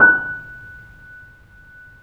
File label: F#, The Piano; InVision Interactive - The Piano; BOESINGSOFT